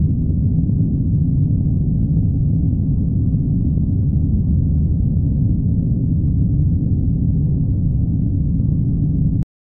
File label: vault like ambient hum